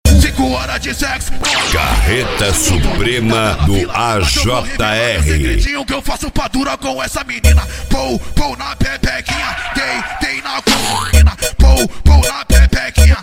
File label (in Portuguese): carreta suprema funk